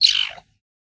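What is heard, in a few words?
chirping, caracal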